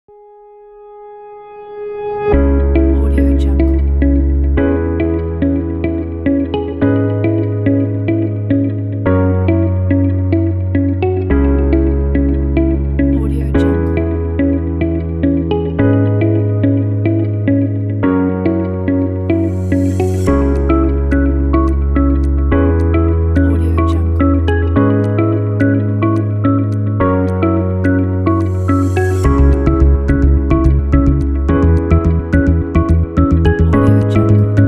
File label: corporate technology intro